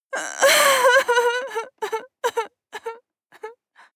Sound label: female cry; koerpergeraeusche; menschen; soundpool